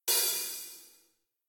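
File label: drums hi-hat open; smite; raijin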